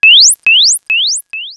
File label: Uniscanner, Retro Games Sound FX